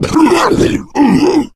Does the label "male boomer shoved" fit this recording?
Yes